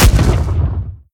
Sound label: punch; smite; cabrakan